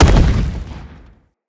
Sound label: Blunt Heavy Impact Vegetation; Weapon Impacts; Player; Environment